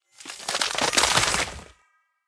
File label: Ice Frozen Soul Rebirth